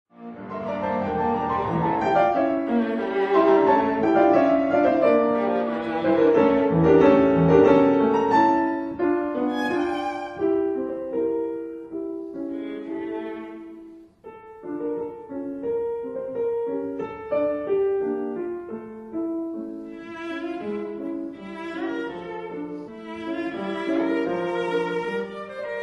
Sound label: Sonata for Viola and Piano in F minor Op 120 No 1 IV Vivace; Brahms